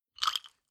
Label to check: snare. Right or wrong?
Wrong